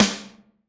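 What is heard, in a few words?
snare drum, 180 BPM; Ueberschall; Drum & Bass Resonance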